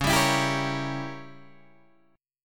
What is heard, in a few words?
Db Diminished 7th Db down Guitar Open G